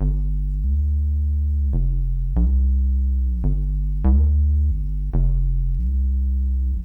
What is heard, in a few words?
BAS Electro